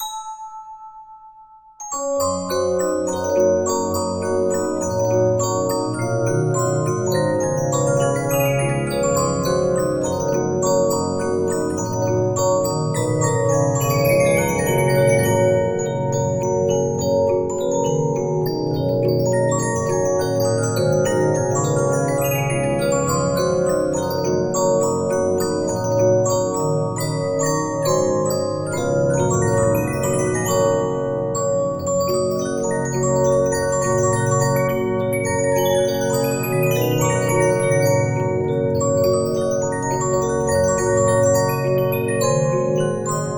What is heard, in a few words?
Regina, 15inch disc musicbox